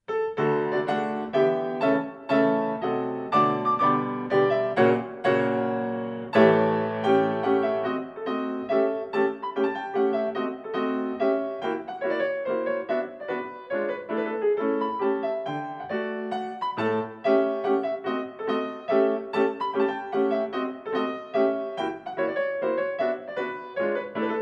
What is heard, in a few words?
chopin mazurka posth d major